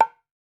CLAY BONG, African Perc; E-MU Formula 4000 Series Vol. 4; Earth Tones

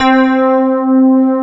pad piano; East West - Global Sounds; S.I KEYS

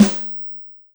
Lite Snare, Yamaha DTXpress Acoustic Snare

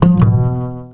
Acoustic F, Basses; Total Drum&Bass

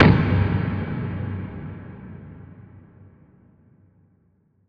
Impact; impact samples

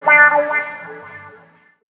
Wah Guitar; Copshow1975

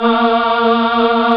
choir

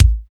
EMOTION KIK; Drums In Your FACE!

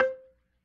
B3 PERC, Vintage Keyboards

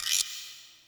Guiro Long, Yamaha PSR E305

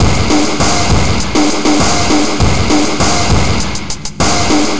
Noise Beat; Drums & Drones

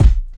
Kick, Kickdrum; Equipped Music; Loungin House

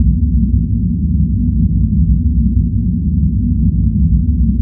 EARTHMOVER, RUMBLER; Spectrasonic Distorted Reality 1

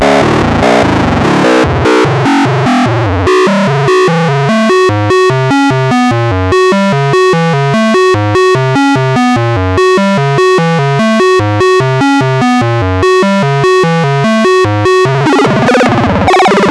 ARP 2600, SEQ; TECHNO-BOX

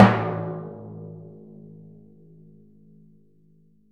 TMP A#, Synclavier Percussion; ILIO; Synclavier Percussion 2; World & Orchestral

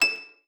STOP D#7, harp; Xsample VOL-8; HARP+FX

